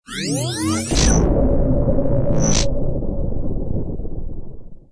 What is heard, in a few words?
reveal alien